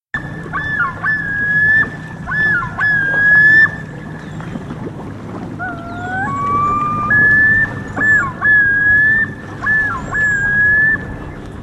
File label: yodel